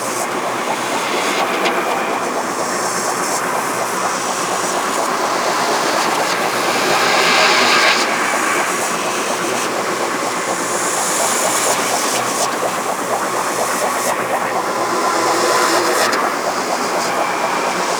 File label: Survival Orb Loop; Warframe; Zariman